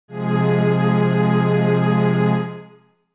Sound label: Ab F Minor